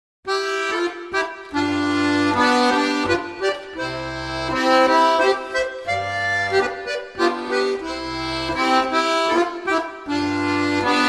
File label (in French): Valse - Belgique; Valse de Jamin